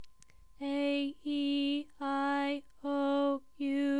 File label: aeiou Mid